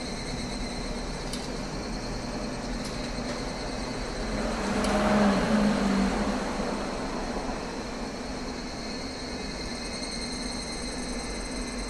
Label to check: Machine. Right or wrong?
Right